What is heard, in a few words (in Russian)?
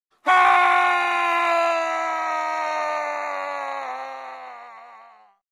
krik v polete